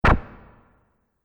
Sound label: Multimedia Button